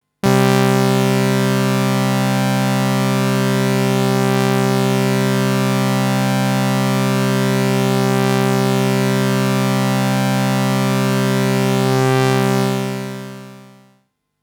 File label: Juno 60 Phasing